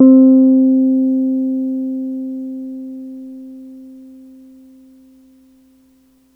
Piano Wurl c3 soft

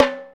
PER Percussion

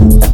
PERC FX, Big Beat; Big Beat Samples & More; AKAI

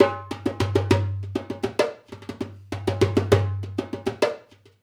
Dumbek; Essential Percussion

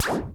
LASER ZAP

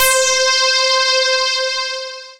Dance Floor Bass, Multisample Basses

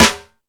Hip House, drum machine

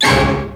HIT, synths; Rubber Chicken Software Sample Bank

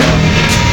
Snare, wavetables; Animoog

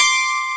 YANG-CH'IN; AKAI S6000 CD-ROM - Volume 1

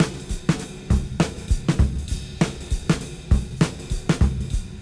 Cym Beat 99 BPM Rev

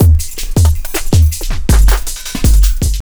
JUNGL 160, JUNGLE LOOP

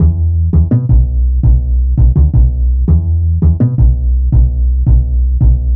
Fat Upright, Bass Lines 166.5; Jungle Warfare 1&2

